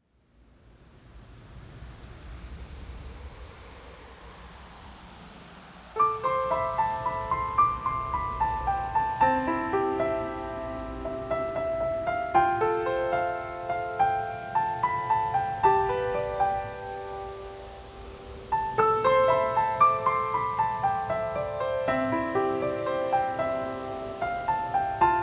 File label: Piano Synth